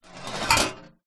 industrial metal ball bearing roll on metal plate surface